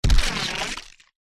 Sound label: science fiction impact alien body hit hard crack squelch bloody